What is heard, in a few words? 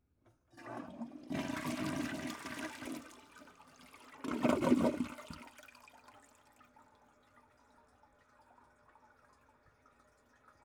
Toilet flushing with seat open